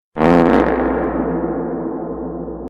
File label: fart